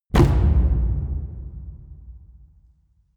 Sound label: drum mid loose